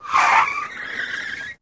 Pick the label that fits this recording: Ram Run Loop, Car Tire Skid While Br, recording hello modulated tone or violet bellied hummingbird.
Car Tire Skid While Br